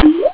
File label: bleep